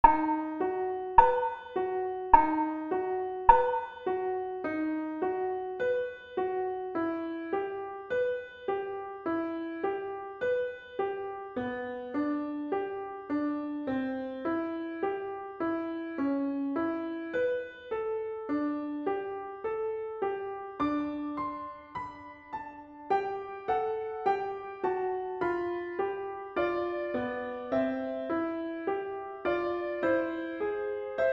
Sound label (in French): alto